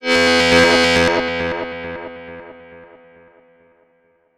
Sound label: FX DIST; FX DISTO